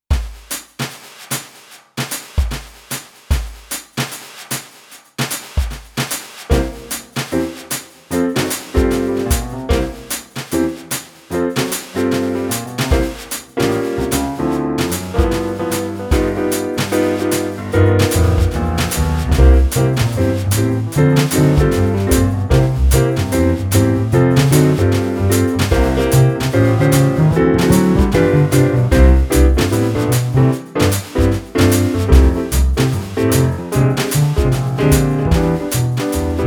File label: Simple Jazzy